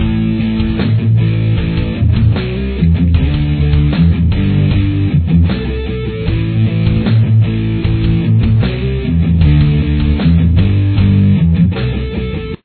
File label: chorus, guitar